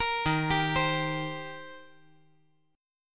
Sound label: Eb 6th Eb down